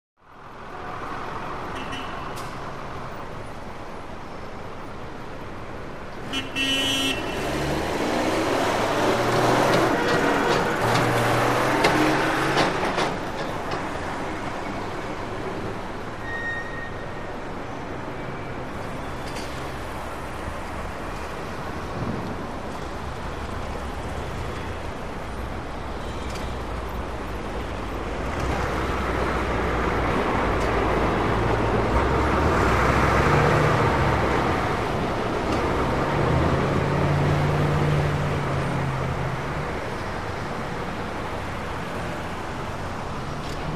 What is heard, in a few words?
City Traffic Heavy; big city